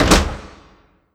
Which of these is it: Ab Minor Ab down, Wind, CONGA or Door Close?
Door Close